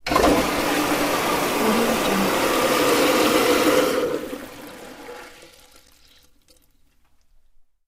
toilet flush

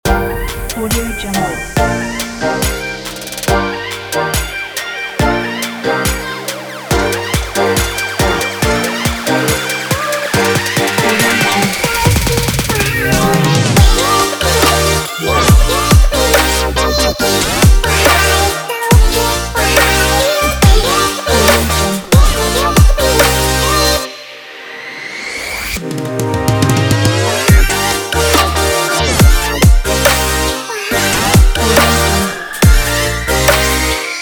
future bass

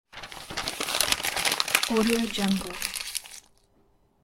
paper crumpling; alexander blu

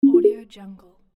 connect

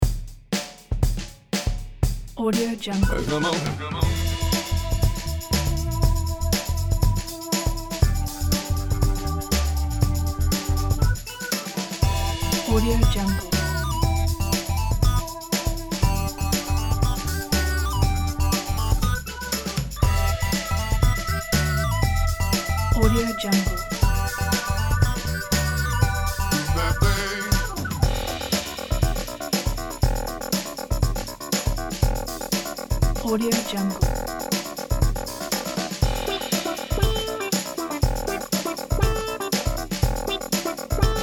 boogie down

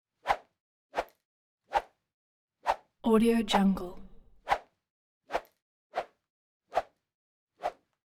punch whip; collanderson

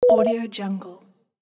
notification

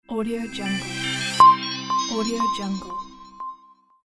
logo

